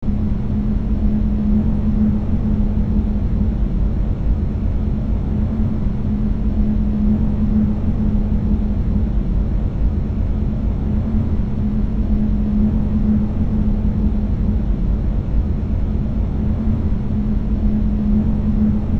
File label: Cave Ambience; The Legend of Mata Nui; Console Games; Game Music